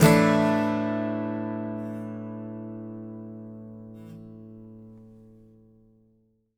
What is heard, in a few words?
ACCGTR SUS, Country; Whole Lotta Country